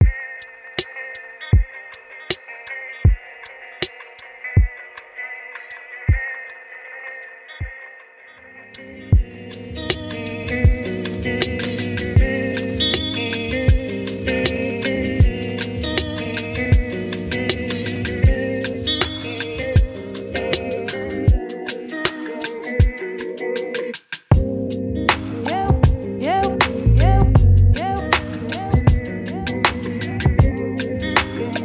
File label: Liquid Bossa